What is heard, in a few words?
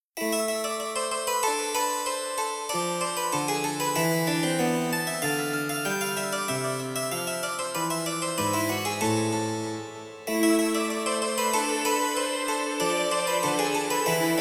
instrumental